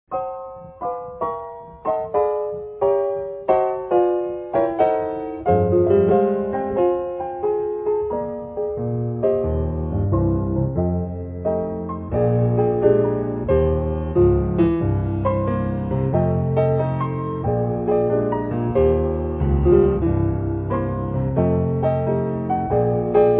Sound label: squeeze